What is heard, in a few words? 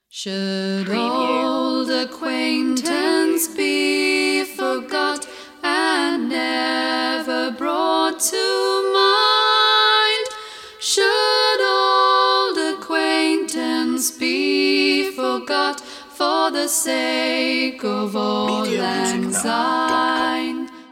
auld lang syne vocal duet longer